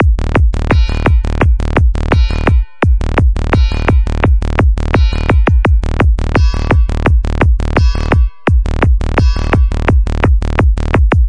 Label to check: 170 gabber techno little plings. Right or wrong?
Right